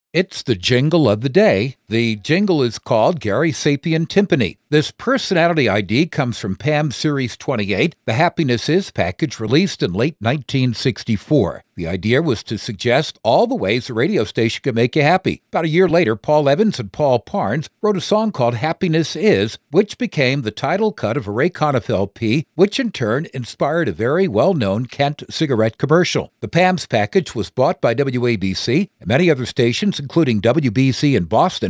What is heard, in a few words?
Tympani